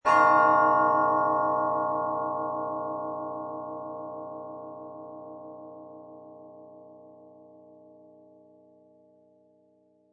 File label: Grandfather Clock Bell Single Stroke; Clocks (Sound Effects:Machines)